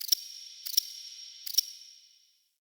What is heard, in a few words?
Under City Tiny Creature; Ambience